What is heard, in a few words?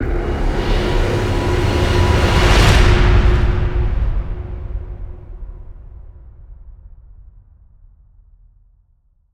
Stinger